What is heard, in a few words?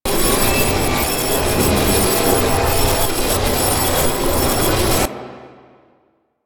Portal Shatter Loop; Warframe; Duviri